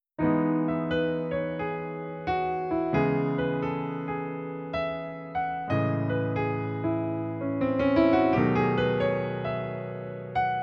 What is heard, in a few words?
7th chords